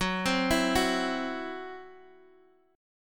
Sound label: E Suspended 2nd Sharp 5th F sharp